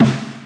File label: snare dark vinyl gated; single hits